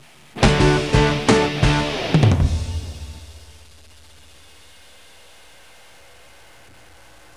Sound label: BonusGame Win; 10 Second Ninja X